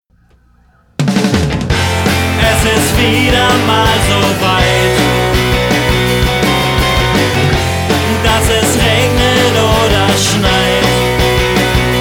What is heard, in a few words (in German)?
Mollakkorde